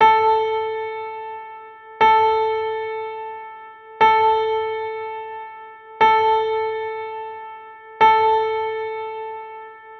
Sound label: pianola